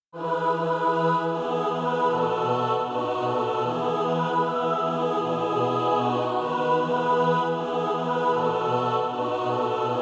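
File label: mixed, Practise Music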